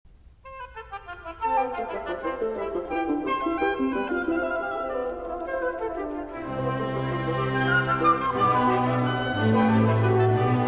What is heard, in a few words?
Chorale variations; musique religieuse